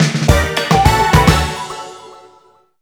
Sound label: Fanfare Get Item; gamerip; Splatoon 3; Alterna